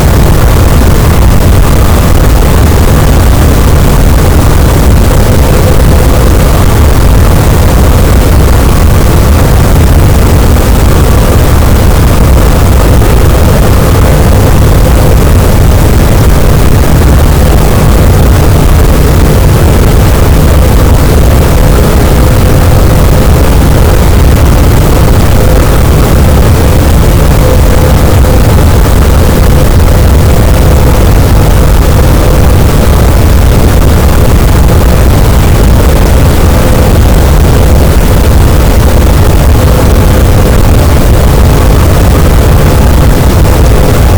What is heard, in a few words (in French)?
cachalot, boat; Physeter Macrocephalus